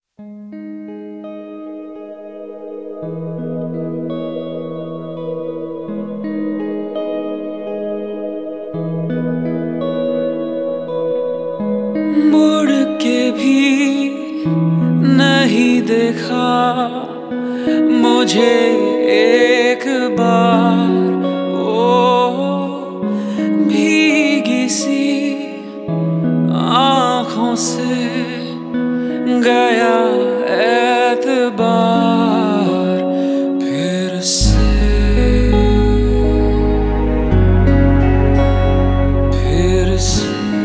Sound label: Sad Version